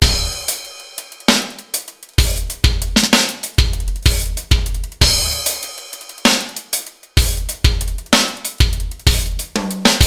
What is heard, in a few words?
pcm70